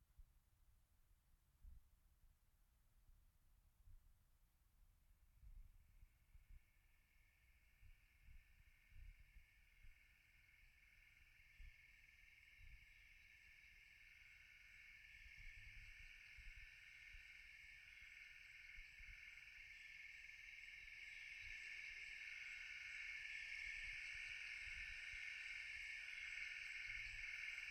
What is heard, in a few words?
Frogs